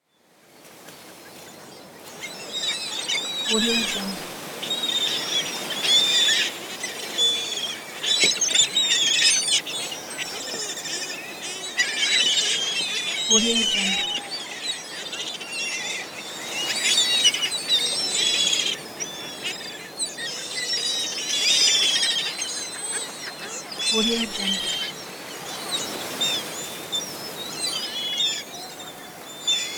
ocean beach ambience with seagulls

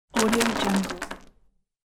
spilling batteries on fabric; domestic sounds

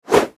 rake whoosh; transitions and movement

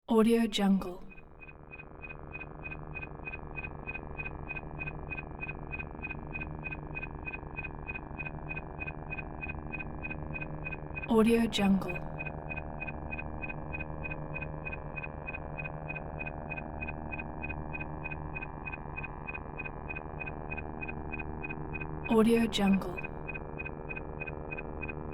cycle helicopter atmosphere

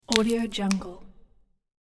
broad bean pod crack; domestic sounds

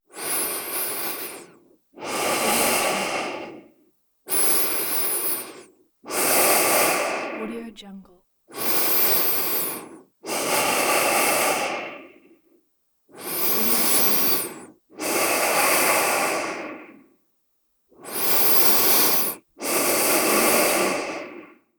slow soft breathing through nose; نفس کشیدن با بینی; human sounds

